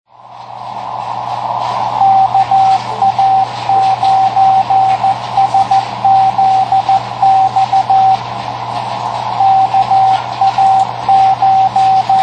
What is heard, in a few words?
beacon, 10m; KA9SZX; 3W; Macomb IL